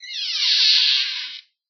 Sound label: creaky door open